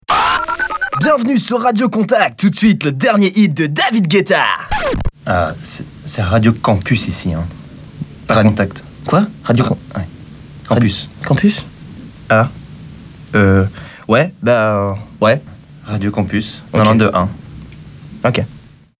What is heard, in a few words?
radio contact session